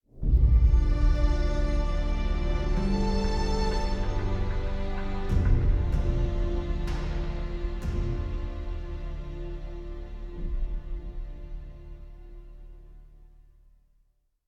death sad; Takedown Red Sabre; Windows Xbox 360

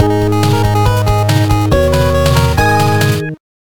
Level Complete; Ghoulboy

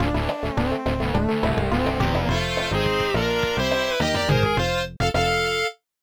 High-Speed Highway Outro; cars supercharged leapster gamerip 2007

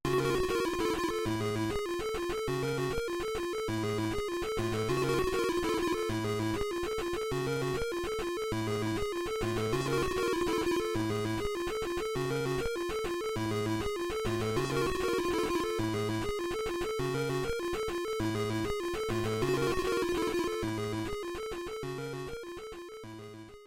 Level Theme; Mouse Trap Hotel; gb

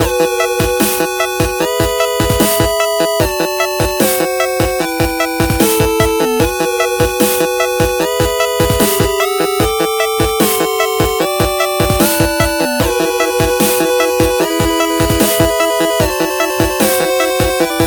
Blip Break; Levels+: Addictive Puzzle Game

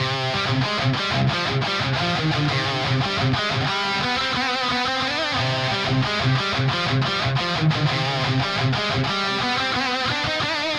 Exploracion LEAD A

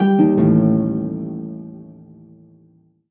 MUSIC EFFECT Solo Harp Negative; startide macos switch windows gamerip 2017

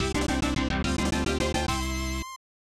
Unused Jingle; Gaia Savior Hero Saidai no Sakusen; SNES

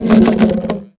pull, water balloon; Birthday Party Bash; Wii

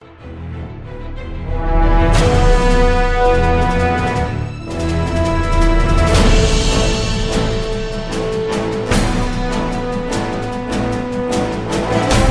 Action Jingle; Red Ocean

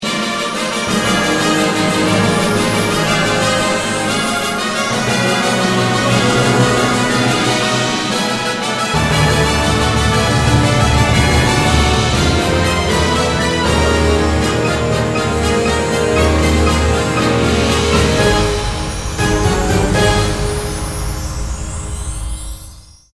Casino Fanfare; mist train girls

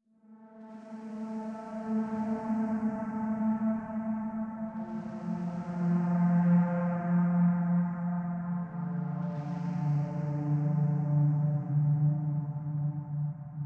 Amb Drone; Worms Rumble; PS4; Xbox Series X/S

